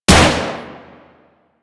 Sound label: Sicarus Shot 2D, weapons; DE; Warframe